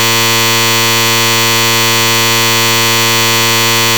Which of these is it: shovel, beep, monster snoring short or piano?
beep